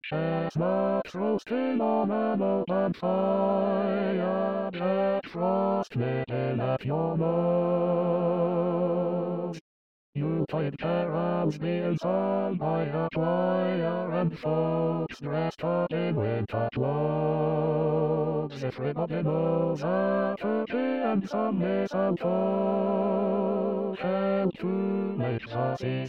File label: Bass SATB